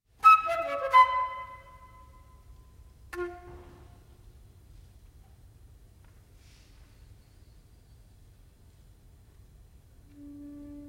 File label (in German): solo fuer Querfloete